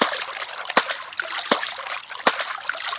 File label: swimming loop